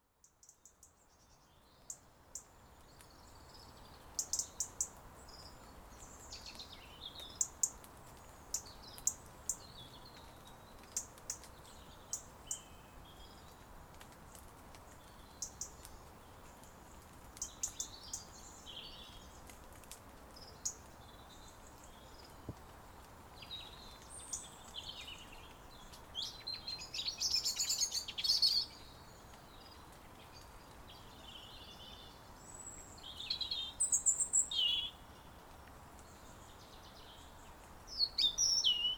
Misty Walk Soundscape

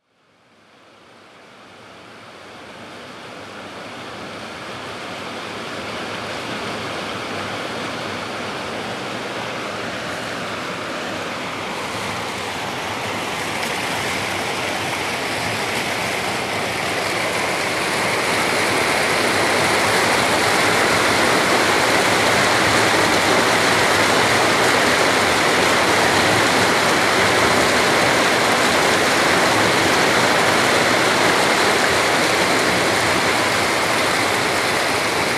The Waterside Soundscape

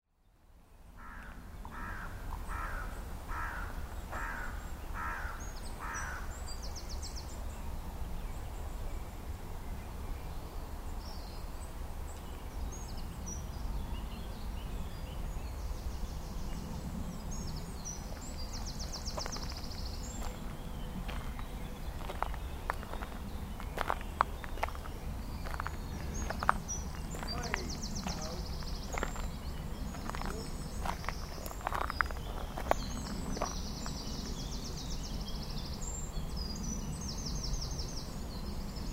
Penllergaer Woodland Soundscape

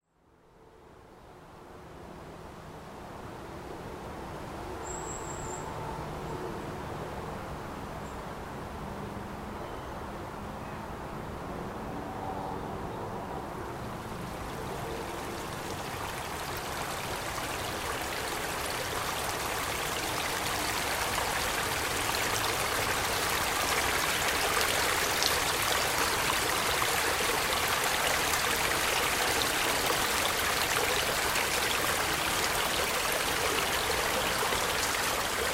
Old Railway Track Soundscape

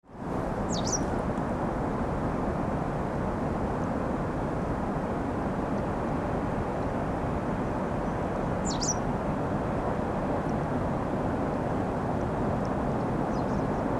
sea and bird; Aberavon

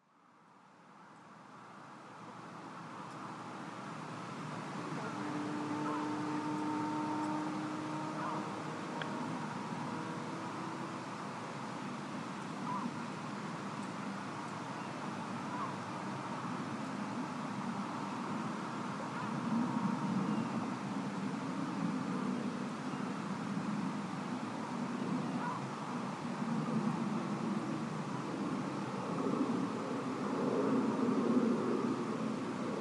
Crymlyn Burrows Beach Soundscape

